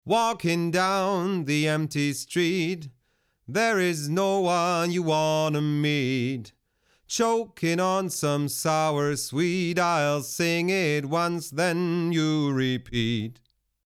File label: ONE LA 500 Vocal Strong Limiter; IGS Audio